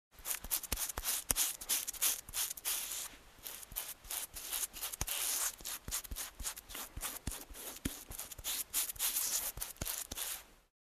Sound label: Cleaning using mini brush